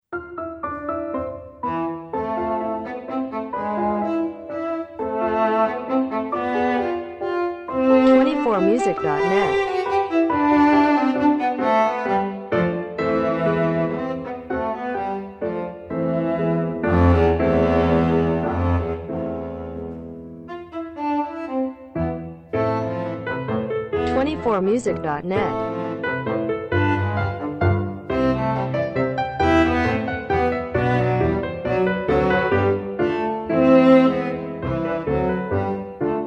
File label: brahms cello sonata in e minor